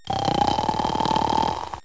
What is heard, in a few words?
Snore; Treasure Galaxy (1994); Learning Company